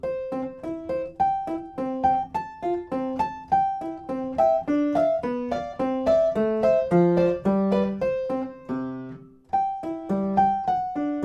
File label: Mozart C major Var 5